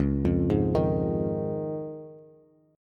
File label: Db Major Add 9th, Bass; arpeggiated; JGuitar